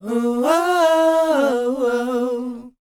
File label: WHOA B, Vocal, R&B & Dance; ILIO; Vocal Planet